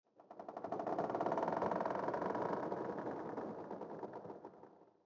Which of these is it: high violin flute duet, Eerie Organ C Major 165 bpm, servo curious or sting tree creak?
sting tree creak